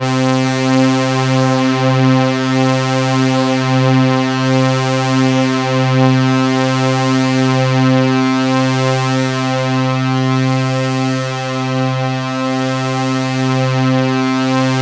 C3, SynthLine

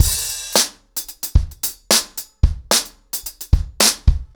110BPM, acoustic drums, drumloops

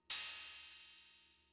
Cymbal; Fricke MFB-522

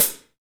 HH, Kits, Rev

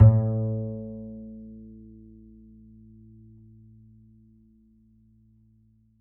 Upright Acoustic Bass; 1ST POSITION; Larry Seyer

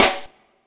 SD, Dance Mega Drums; Best Service

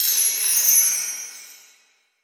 Chimes; Essential Percussion

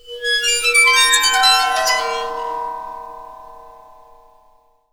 waterphone

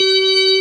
B3 G#3, ORGAN; VOCAL ORGAN; ORGAN #1

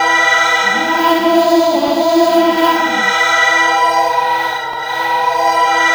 REQUIEM, GOTHIC CHOIR; Spectrasonic Distorted Reality 1

